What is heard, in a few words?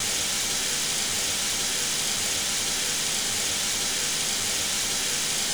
Water Kitchen Faucet Loop